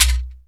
percussion, Ethnic Perc

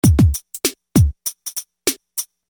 Beat, Hip-Hop Street Beats

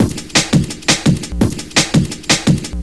Beat 100; Sonic Foundry; Crimson Blue and Fabulous Horncraft 4 RnB; Loop Samples; FastBreaks

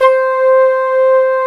flute C5; Greytsounds; Scott Peers Sound Engineering

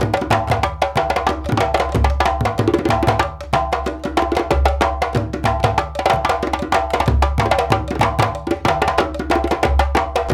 Lamba, African Contemporary

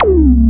DRONE ZAP; Back In Time Records; Big Bang

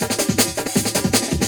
Beat, Industrial

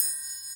triangle, percussion

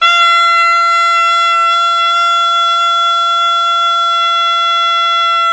BI SUA F5, BISUA REED; Spectrasonics; Heart of Asia

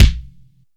KICK, GATE KICK

